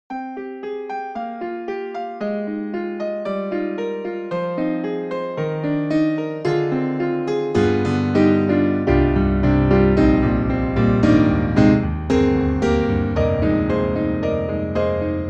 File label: Stereo Piano; KORG KRONOS 2; Boesy